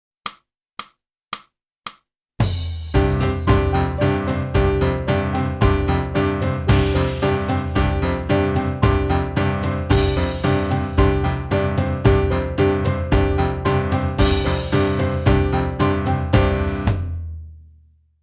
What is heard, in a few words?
flute; boosey